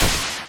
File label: Boomerang Hit; gamerip